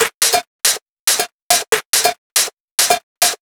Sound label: Loop 140 BPM; vengeance essential club sounds-3